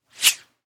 Whip Whoosh Guitar, Foley, Whip, Whoosh, Guitar, Strings; Whips; Objects; BlastWave